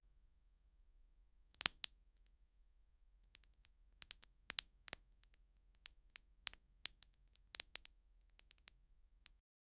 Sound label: leather buckles unfasteni